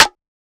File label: Sliced Snare; Vignette Kit